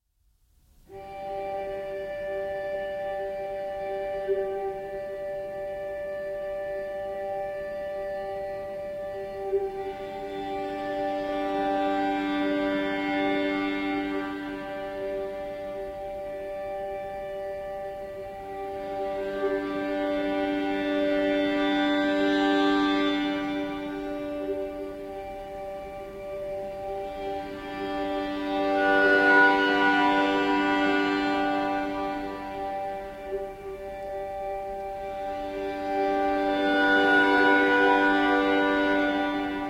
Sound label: Drone Breath